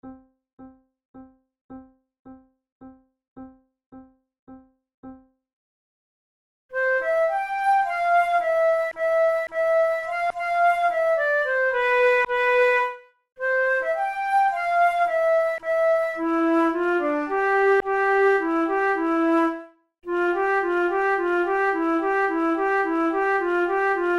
fantasia per flauto solo in g major tempo di minuetto; mercadante